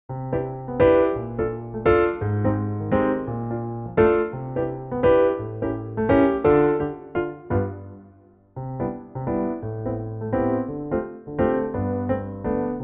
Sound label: C piano